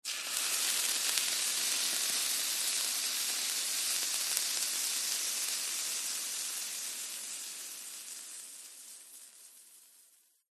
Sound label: household water sizzle on hot sandwich toaster surface slow burn